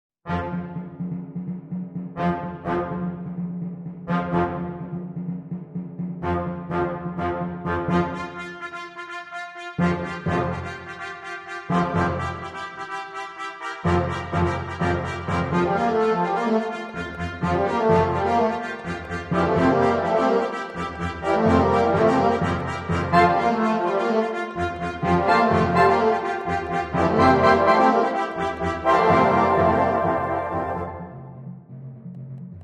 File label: Amazing! - Fanfare